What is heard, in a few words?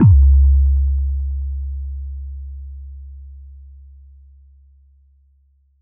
Bass